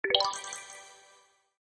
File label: bonus